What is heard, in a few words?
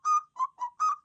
flute; fowl-play; enemies; timmy